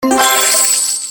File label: choice win symbol; games; Book Of Fortune